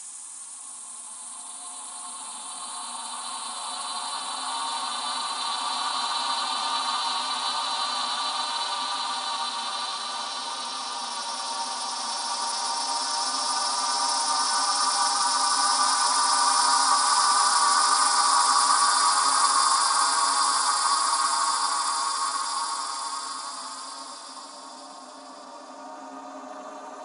Lower Shimmer Reverse; SoundChaser; CerebralAudio

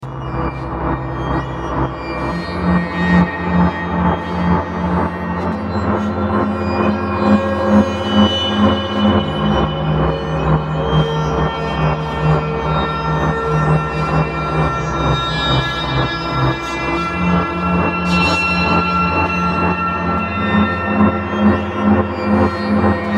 Dip Insaid

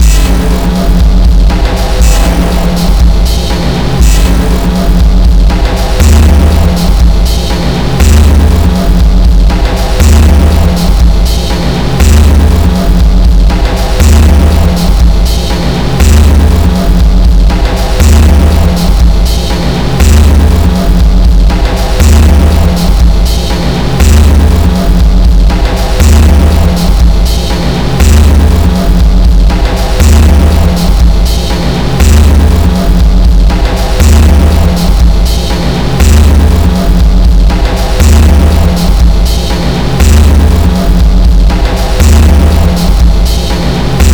NoiseDance

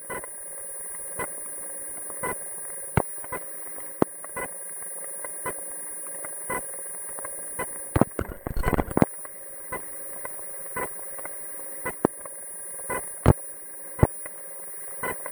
Dell Latitude windows for loop; Valentina Vuksic

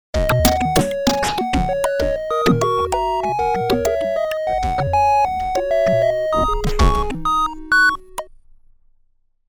Level Completed

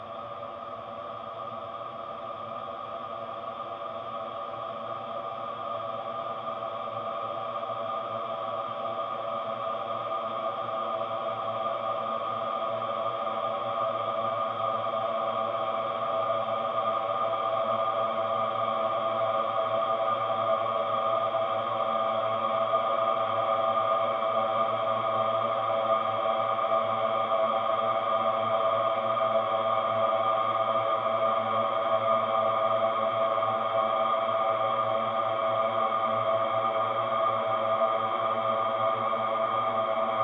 Sample Tri Tones One; SoundChaser; CerebralAudio

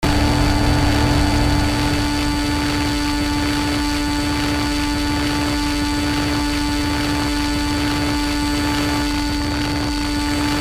brain Waves Tuning

